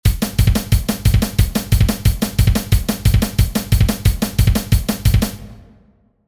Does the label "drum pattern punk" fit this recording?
Yes